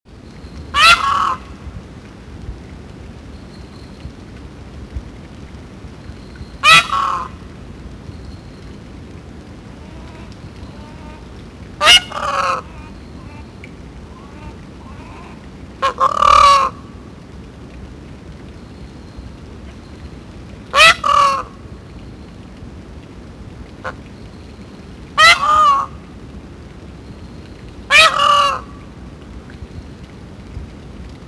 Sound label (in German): pfauhenne sanft